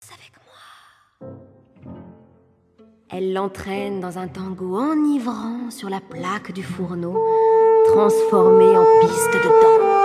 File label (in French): Trio basson clarinette trompette; la revue de cuisine